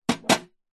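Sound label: industrial wrench adjustable plastic handle set down metal tool box; zapsplat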